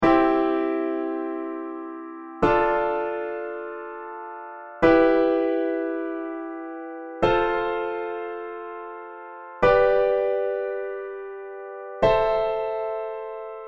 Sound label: Gt triad diatonic